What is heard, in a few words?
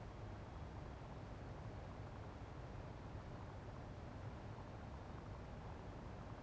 Kitchen; blue archive